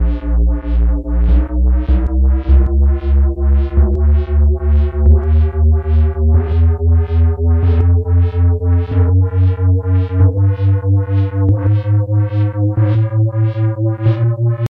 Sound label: E Chromatic